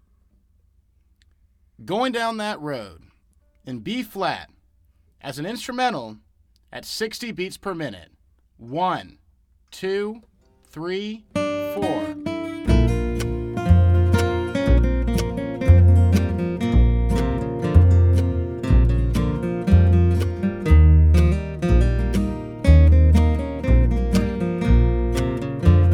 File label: Goin Down Road Bb Slow